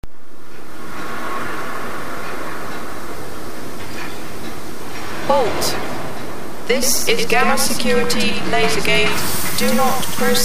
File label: Lasergate